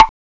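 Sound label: WOOD BLOCK; PERCUSN; CMI Sound Library Rev 1.3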